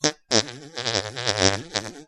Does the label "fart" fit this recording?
Yes